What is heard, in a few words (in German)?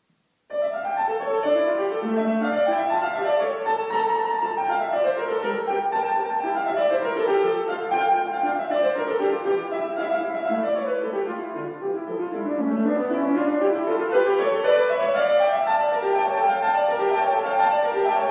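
Sonate in d moll, Klaviermusik; domenico scarlatti; K1 L366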